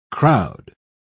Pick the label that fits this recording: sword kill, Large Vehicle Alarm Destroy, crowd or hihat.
crowd